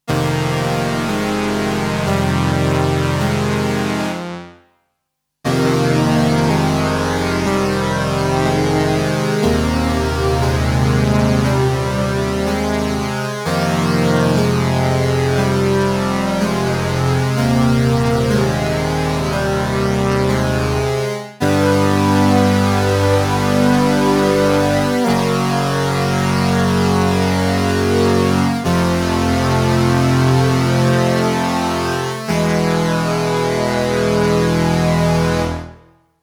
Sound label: Lexicon Alex Flanger